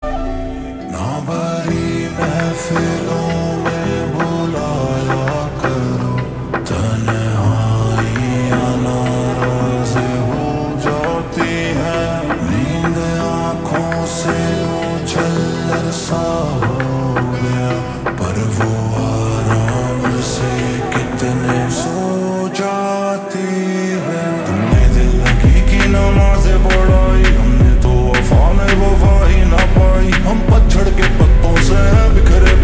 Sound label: Ringtone Slowed Reverb